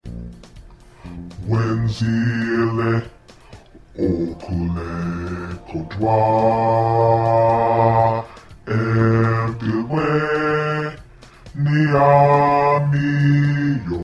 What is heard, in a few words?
Bass